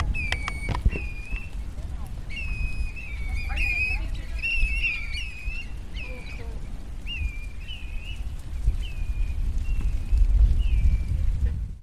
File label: Black bellied Plover; Crandon Park FL